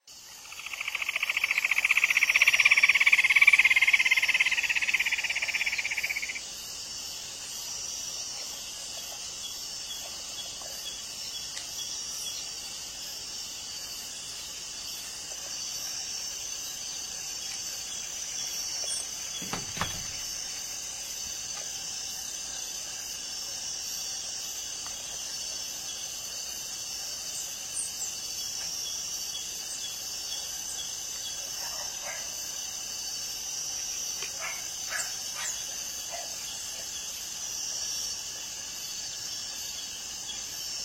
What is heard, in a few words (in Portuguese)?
Canto do sana vermelha